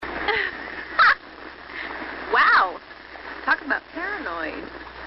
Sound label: Scream